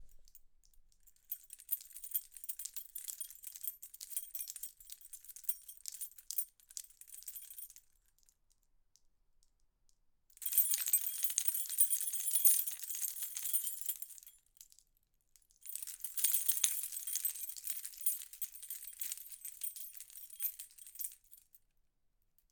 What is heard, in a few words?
Rustling Keys